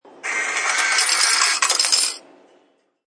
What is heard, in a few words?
Chng Mchine Coins, Coins drop and clank out of coin machine.; Coins from Change Machine; Clanks; Objects